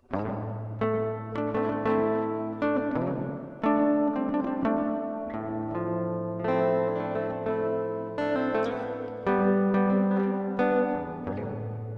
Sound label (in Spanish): Sample Guitarra Reverb